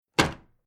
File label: Household Old Wooden Writing Cabinet Top Desk Slammed Closed Wood Creek